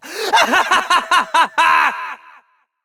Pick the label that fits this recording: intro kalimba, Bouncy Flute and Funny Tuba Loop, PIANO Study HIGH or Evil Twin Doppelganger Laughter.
Evil Twin Doppelganger Laughter